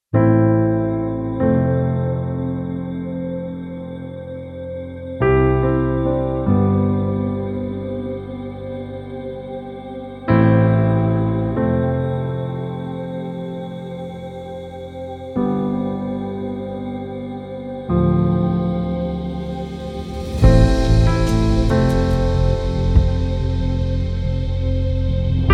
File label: Chilled Ambient